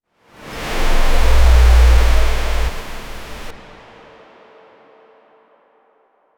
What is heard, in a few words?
a fast swoosh sound